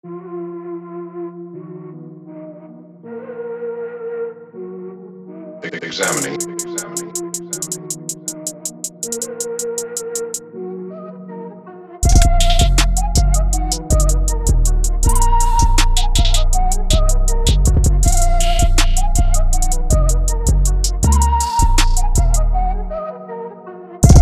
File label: A# minor 160 flute